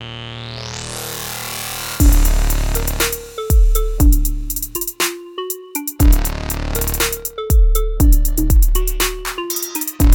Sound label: SEM Trap; OBERHEIM SEM